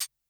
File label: button Down; netent